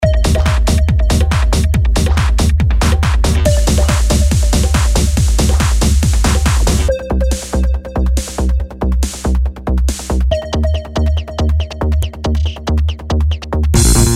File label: Techno Trance